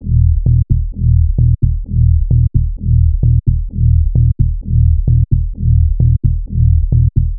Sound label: Bass Loop 130 E, Techno; Ghosthack; Free Techno Sounds 2024